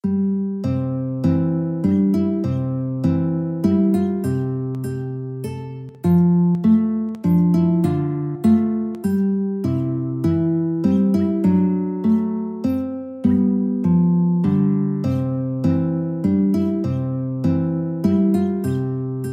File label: away in a manger, guitar